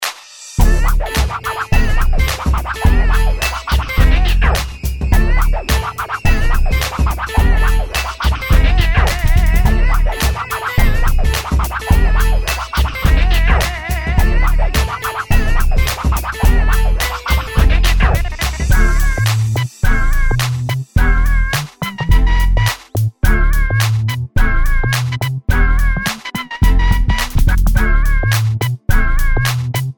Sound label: Music background pads